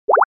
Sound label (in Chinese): 点击